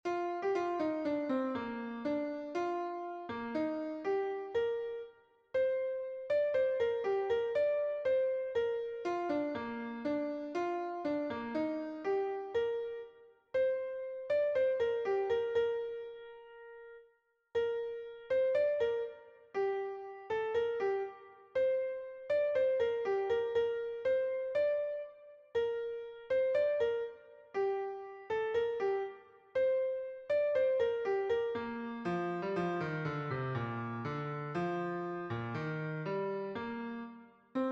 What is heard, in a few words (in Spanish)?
TENOR, himnos en piano; DE LOS CIELOS DESCENDIO; cantos del camino